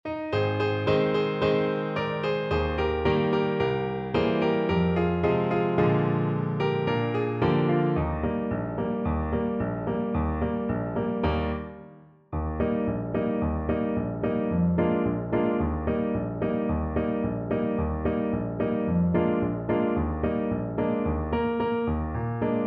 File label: Clarinet